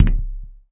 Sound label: glass, footsteps; player; Dystopia